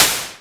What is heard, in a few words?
Percussive, Drums Perc One Shots